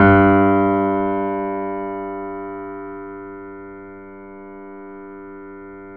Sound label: G 1, GRAND P; USB Soundscan vol.17